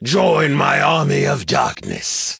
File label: Taunt; Hero Mortemis